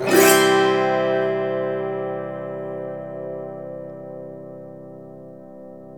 AUTO HARP

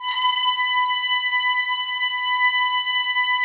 Trumpets, BRS TPS 2ML; Roland - LA Composer vol. 4

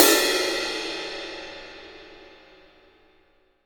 16 CRASH, CYM; Greytsounds VOL-1; SDX HATS+CYM

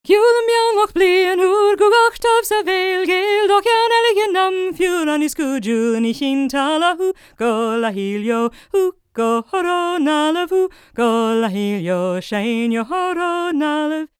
GAELIC, World Voices, GAELIC FEM

